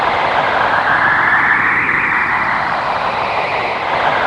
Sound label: NOISE STORM; Zero-G Ambient 1; WNOISE ATMOS